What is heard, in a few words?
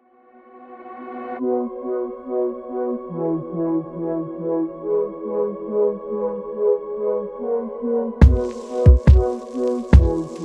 instrumental